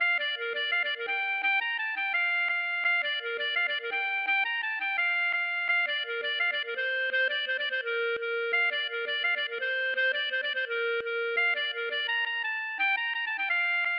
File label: gavotte